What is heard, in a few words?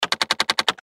Button Switch Gear Multimedia; Web Interactive